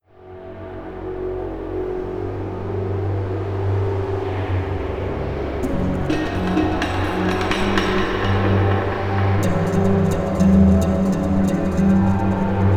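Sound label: Garden Ambient, game music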